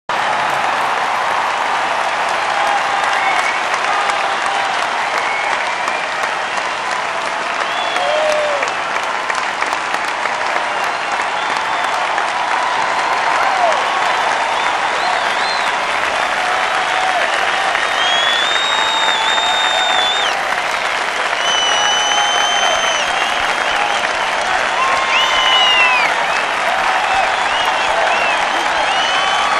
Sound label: Stadium; Colin McRae Rally 05; XBOX